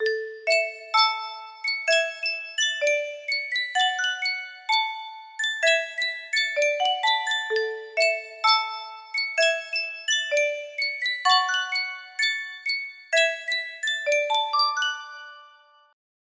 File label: sankyo custom tune music box